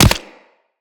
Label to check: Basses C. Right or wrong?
Wrong